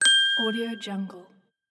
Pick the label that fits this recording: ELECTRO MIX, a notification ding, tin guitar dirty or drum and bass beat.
a notification ding